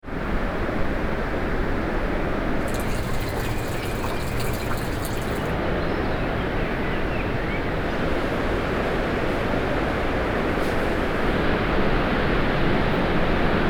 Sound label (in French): descente Pont du diable